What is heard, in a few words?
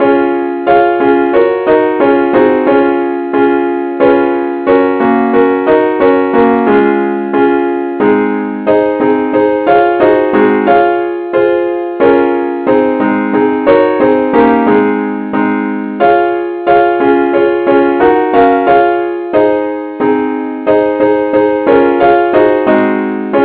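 advance accompaniment key cis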